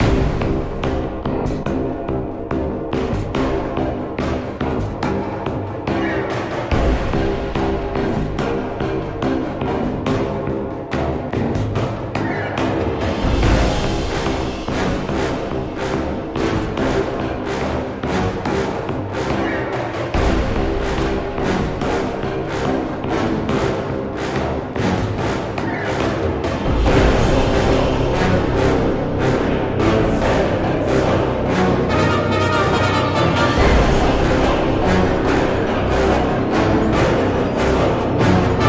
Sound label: V'Ger Combat Full Mix